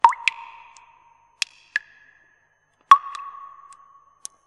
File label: water drain